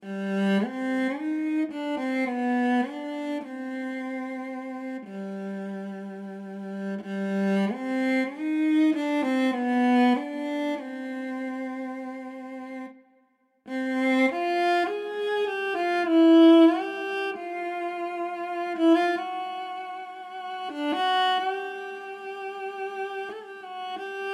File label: Viola; sample modeling viola